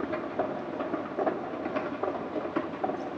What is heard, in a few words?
Escalator Stereo; LOOP